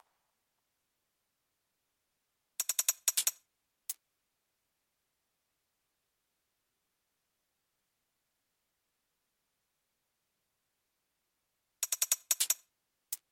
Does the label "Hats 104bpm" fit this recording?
Yes